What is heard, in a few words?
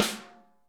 SN, Real Mega Drums; Best Service; Real Mega Drums VOL-1; SN AMBIENTEC